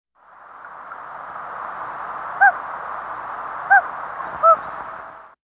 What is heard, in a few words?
hoot, loon